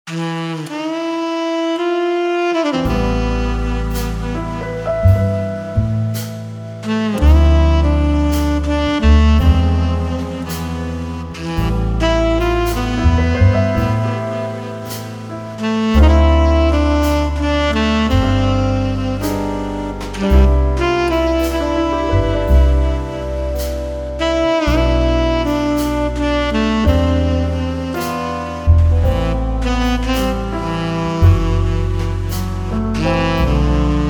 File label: sensual jazz medium